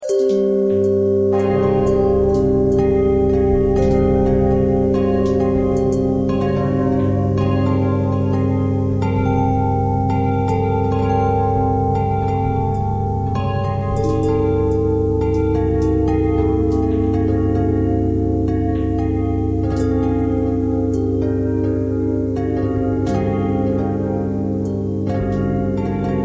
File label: bell bell thread pentatonic, bells